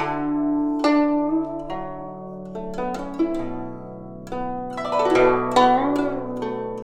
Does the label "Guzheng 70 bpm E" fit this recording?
Yes